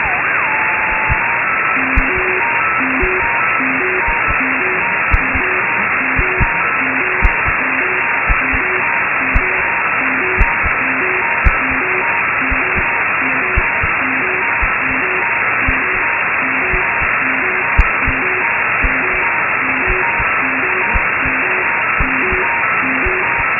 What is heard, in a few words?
rx tone sequence; optical comms; swasey